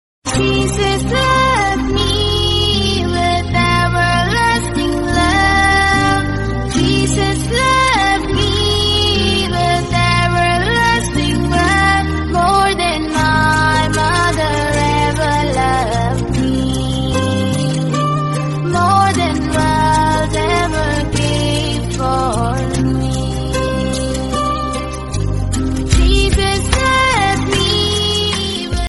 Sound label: devotional english song ringtone, christian gospel